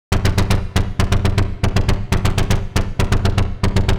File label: Chaff Perc 120bpm; horrorscape